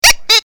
clock

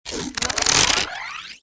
red tape; Toontown Online

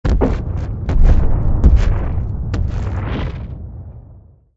tremor; Toontown Online

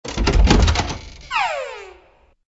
trap door

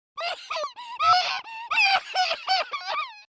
emotion very sad

